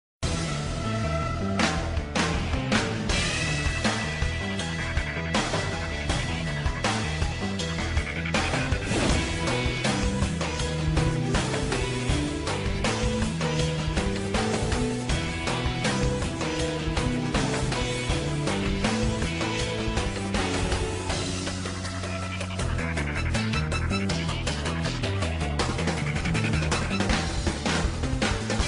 Cool Bg

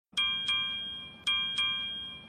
trolley

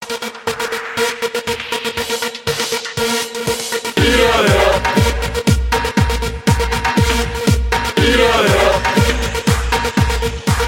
Beer Rave Sms

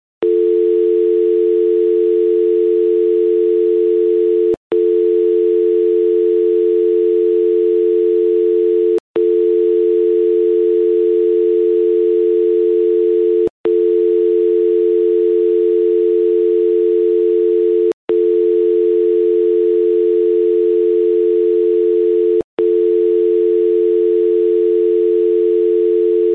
Engage Tone

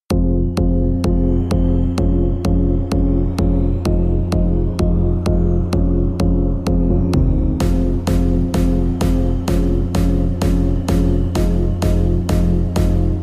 Cheesy Drop

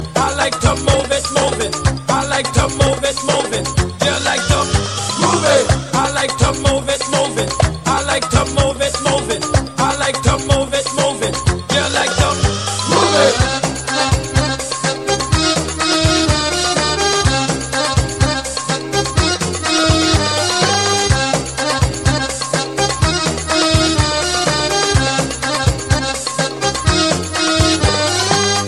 Arabic

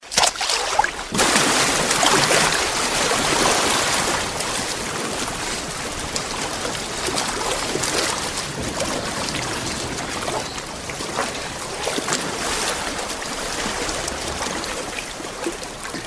Sea Water Splash

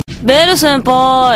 Bell; Sempai

